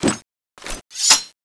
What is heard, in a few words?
swing end, dual sword; tfa cso